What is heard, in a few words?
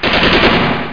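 machine gun; ARCADE; NOS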